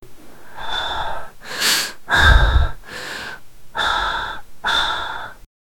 gasping for breath

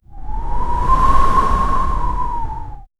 gentle evening wind

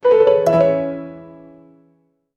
humorous musical sting wh, lighthearted

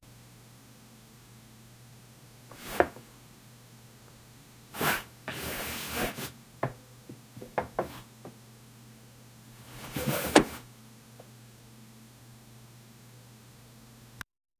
a man sits down on, chair

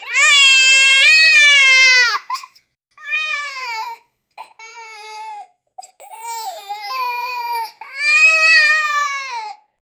child crying, upset

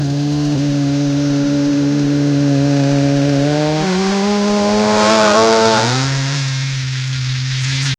realistic ambient sound, desert

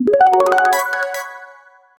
electronic success sound, shimmering

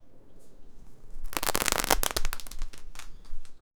tent zipping open

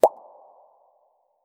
single water drop echo

